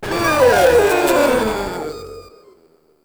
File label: Proto Infested Runner Death, Enemies; Warframe